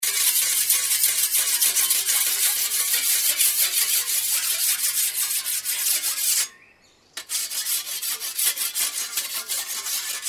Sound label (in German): Sense schleifen